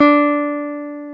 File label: Jazz Guitar